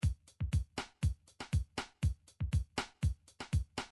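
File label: midi drums, country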